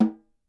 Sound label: SN, Percussion